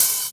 Open Hats, Cymbals

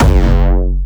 Jumpstyle Kick F#1; Bassdrums; Jumpstyle Multis; vengeance essential club sounds-3; VEC3 Bassdrums

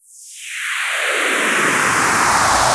Sweeps Medium

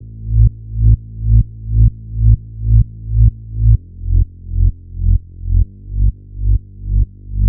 128BPM Control Bass Root A; Vengeance Samples; Control Kit

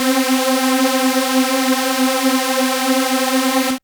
Synths C; vengeance essential club sounds-3

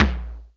Percussion; Vengeance Samples

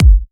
Bassdrum; Vengeance Samples; VEE Bassdrums

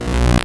Arcade Riffs

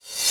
Cymbals Reverse Crash; Vengeance Samples

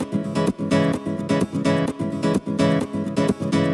Nylon Guitar C# min, 128BPM; Vengeance Samples; VEH3 Nylon Guitar Kit 2

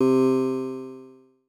synth poly c2

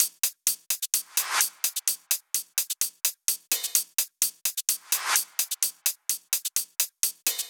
128BPM Drums; Vengeance Samples; Knight Kit

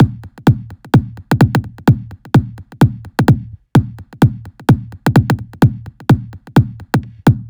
Big Wobble Kit 11, 128BPM; Vengeance Samples

